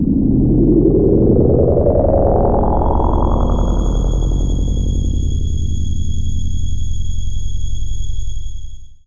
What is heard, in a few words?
RISE; COMPLEXHITS